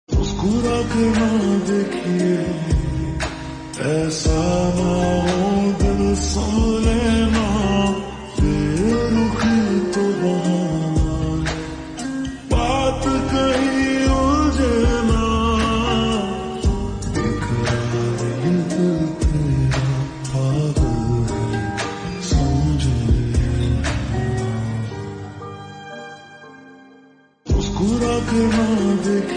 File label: Slowed Reverb; Meem Se Mohabbat OST